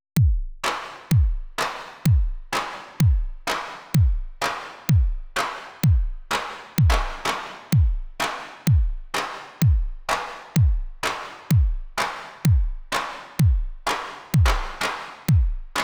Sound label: drums, synth